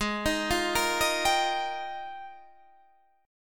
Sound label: F Minor 11th, guitar chord